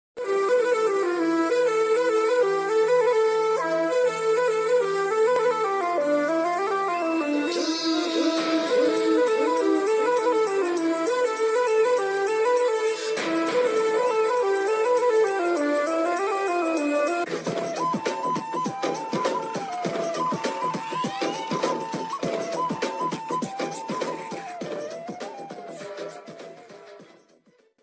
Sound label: instrumental ringtone